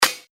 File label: heartbeat; Crimson Stella